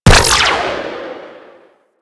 Flak Ship Rifle Fire 3D B; Crp Flak Ship Rifle

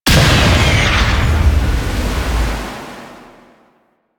Rain Of Arrows Start; Warframe; Duviri; Duviri Dax; Abilities; Dax Archer

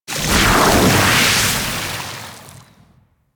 Tidal Wave Cast; Warframe; Warframes; Pirate

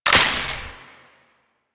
Belt Fed Rifle Mech Stop; Weapons; Warframe; Tenno; Frumentarius

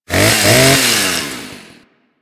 Ghoul Sawman Rev, Attacks; Warframe; Grineer Ghouls

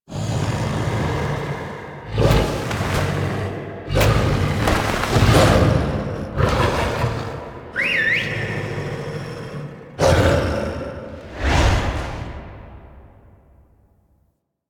Idle Noble Rifle; Warframe; Voruna; Idles Noble

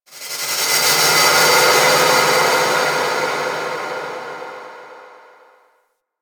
Texture Small, Ambience; Warframe; Orokin Moon

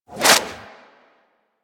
Serpent Ability Tendril Whoosh; Warframe; Warframes; Alchemist

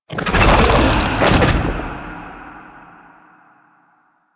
Sarcophagus Spawn; Warframe; Mummy

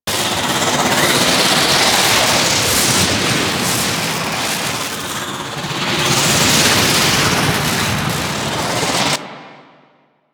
Void Tear Loop; Warframe

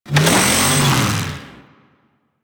Saw Melee Swing Long; Weapons; Warframe; Grn Ghoul Saw Player

